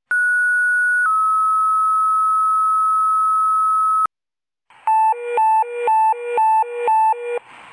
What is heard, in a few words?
Pager Tone warble